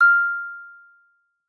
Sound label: musicbox